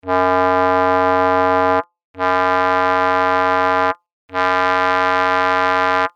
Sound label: tones, woodwinds